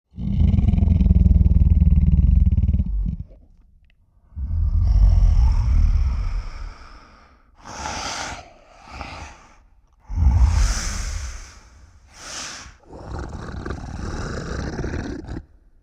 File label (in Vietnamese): tieng tho va tieng gam gu cua quai vat; am thanh hung du ghe so